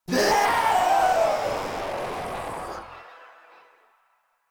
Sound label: Runner Death; Enemies; Warframe; Proto Infested